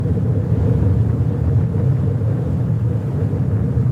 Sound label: summonerspell base ignite; LoL